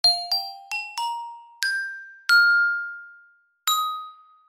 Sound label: TECHY STEPS Jingle